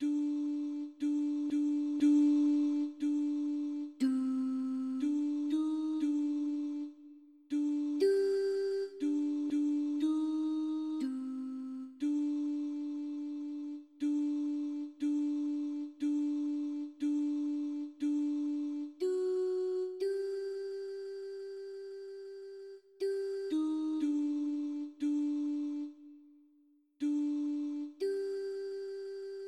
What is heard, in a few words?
alto; enosh kekhatsir yamav